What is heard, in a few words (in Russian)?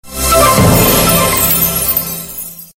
magicheskoe sms soobschenie prikolnyy rington dlya sms